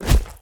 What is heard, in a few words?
Big Monster Attack Hit